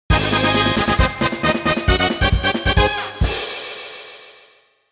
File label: Win; Multiple Winners; Mario Party 2; N64